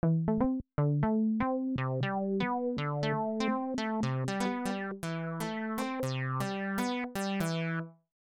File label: Retro Synth with effects